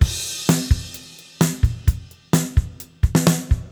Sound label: 129, Beat Loops; Zero-G; Monster Beats